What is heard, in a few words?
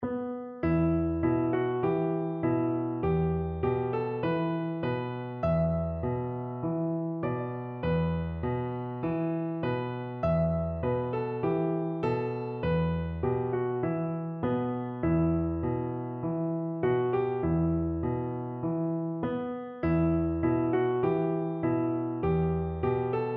shalom chaverim, piano